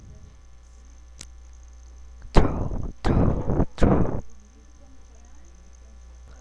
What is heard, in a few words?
throw